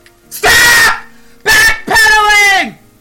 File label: backpedaling